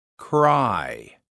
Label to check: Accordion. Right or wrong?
Wrong